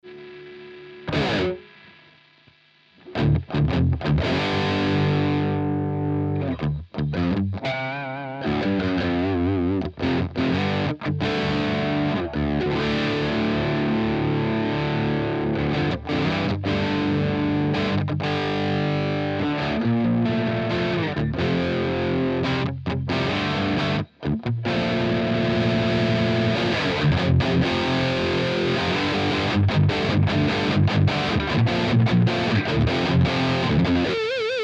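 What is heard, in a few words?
jvm 410h hard, marshall jvm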